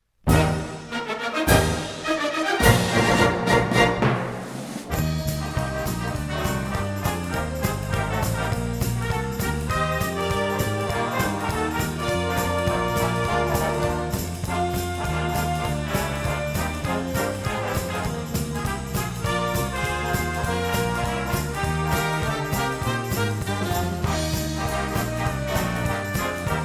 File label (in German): Alte Kameraden Swing